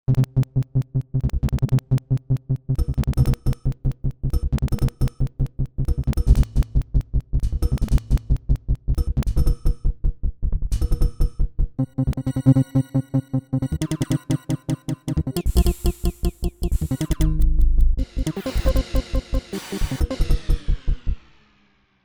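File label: Sequence Beat Explorer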